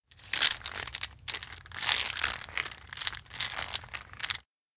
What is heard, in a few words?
grind mill; anemoi